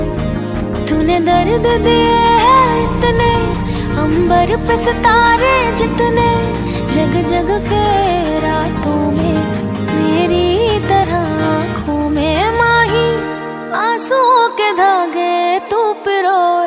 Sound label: Female Song Ringtone